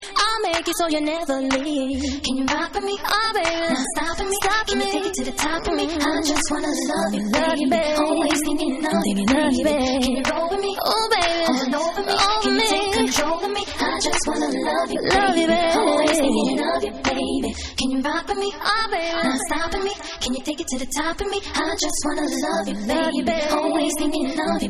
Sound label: Time Stretched Acapella